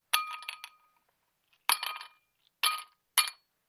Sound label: Ice Cubes Glass